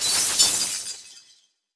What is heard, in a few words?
Ice